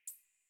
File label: Computer Room Beep Misc